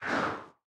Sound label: throw projectile; fight